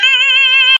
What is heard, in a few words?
Scream; Jontron